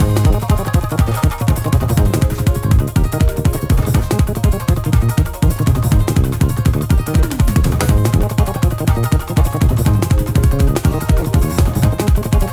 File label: music free spin; serengetikings